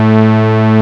MONO-POLY, YAMAHA; USB Soundscan vol.49 - Analog Fantasies; AKAI

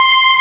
Haunted Piano; SNES; Demon of Laplace

